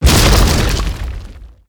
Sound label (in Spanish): terremoto